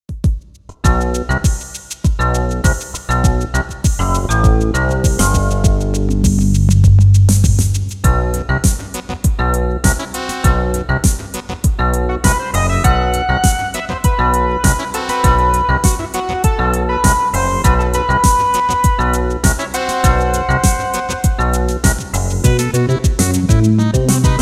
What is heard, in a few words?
techno